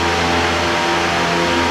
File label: Generator